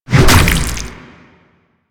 Tentacle Swing Hit; Enemies; Abilities; Warframe; Proto Infested Ancient

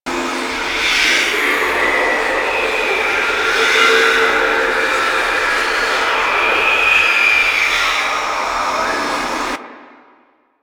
Grimoire Active Loop; Enemies; Warframe; Entrati Doppelganger

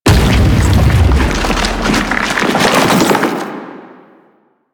Octopede Lash Limbs Slam; Warframe; Man In The Wall

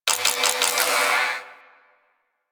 Rolo Dex Swipe, Ship; Ambience; Props; Warframe; Corpus Ship Remaster

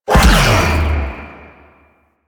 Whirlwind Dash Empowered Impact; Warframe; Caliban

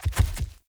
Wolf Steps Land Default; Animals

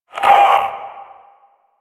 Vocal Pain; Enemies; Warframe; Priest Quest; Possessed Red Veil

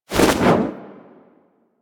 spin roll whoosh; characters; generic foley; Warframe

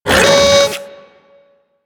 Thumper Bot Servo E; Warframe; Grineer

